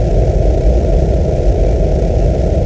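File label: floater search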